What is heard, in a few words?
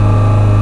generator hum large